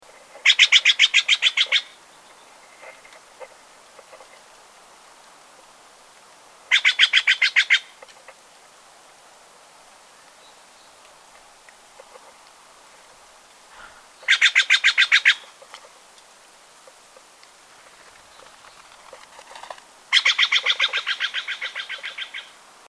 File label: Stellers Jay Cyanocitta stelleri macrolopha